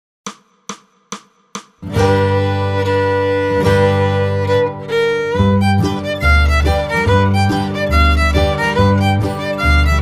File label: Carroll County Blues Fiddle 140